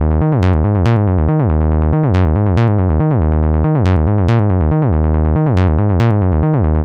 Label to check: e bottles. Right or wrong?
Wrong